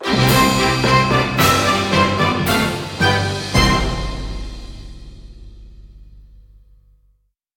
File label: Big Orchestral Win Fanfare